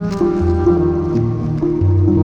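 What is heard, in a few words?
G Abstraction, 130 bpm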